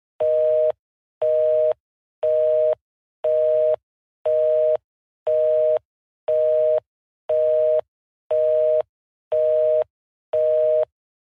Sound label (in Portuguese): som telefone sinal desligado ou ocupado